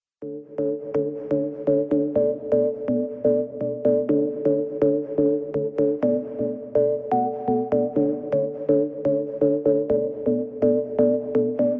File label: bgm; mine sweeper; epoch times